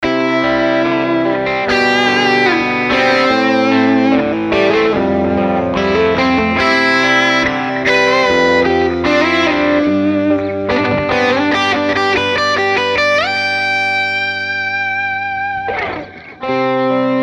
Bogner Mojado

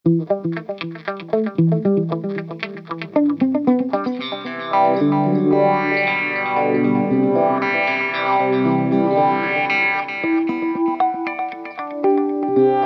TC G-System Phaser Delay Clean

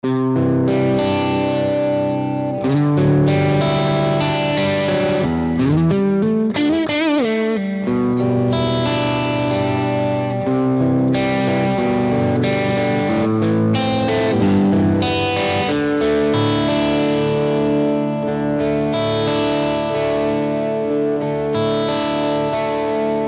Randall MTS Top Boosted

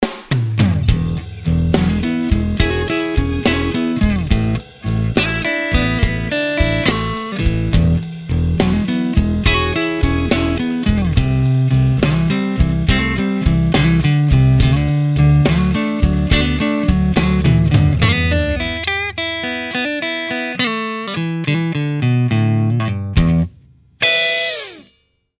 Experienced Clean Eminence Private Jack

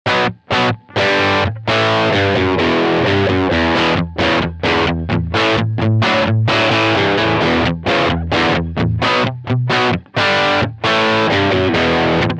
Gibson SG Orange AD30HT Dirty

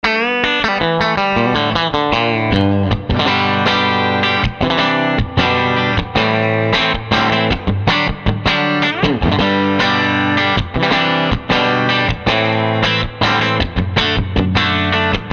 Ethos Overdrive Clean Country Verb; Custom Tones

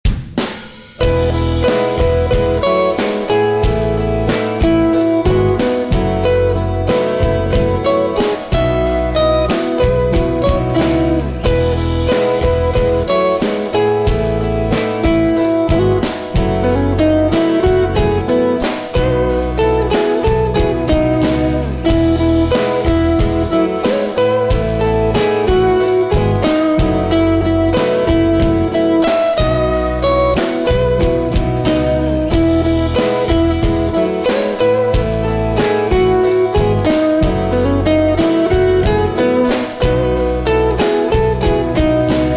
Dean Markley Vintage Bronze Medium 13 56

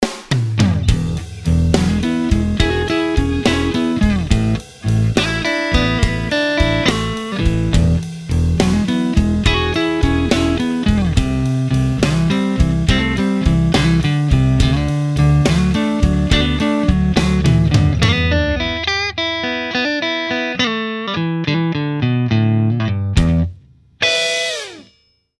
Experienced Clean Jensen Electric Lightning

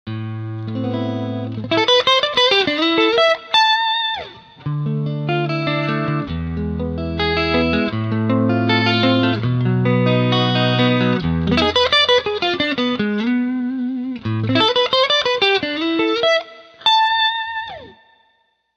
Nash T63 TRex Room Mate Roland JC120s

